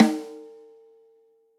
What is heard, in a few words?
SNARE Low stick rim shot